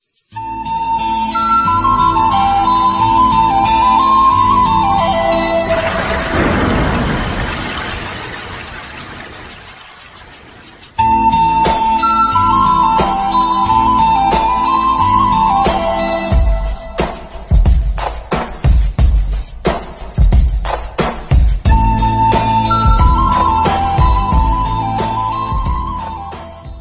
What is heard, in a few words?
Mobile Ringtone; Airtel